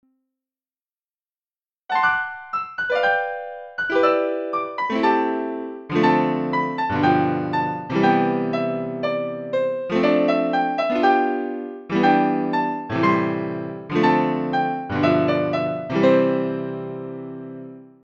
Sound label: Jazz fifth progression